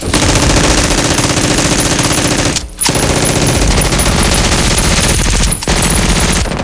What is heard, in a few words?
mg unfire